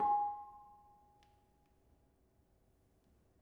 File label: Bonang Barung, gamelan